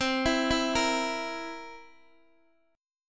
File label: C 7th C down Guitar Open D